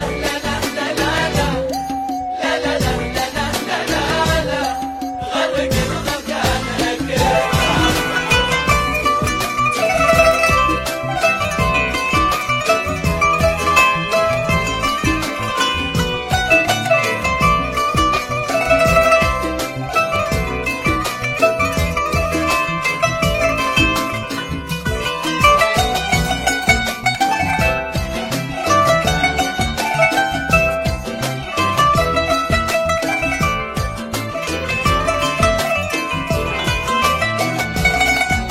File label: santoor